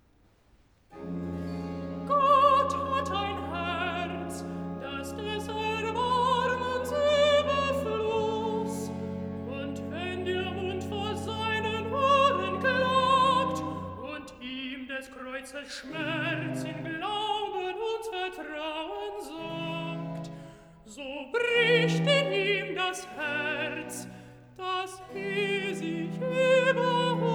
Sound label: Recitativo (Alt), BACH for ALTO; Was Gott tut das ist wohlgetan; Gott hat ein Herz das des Erbarmens Uberf